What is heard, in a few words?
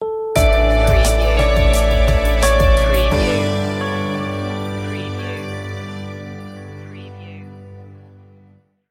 growing radiance stinger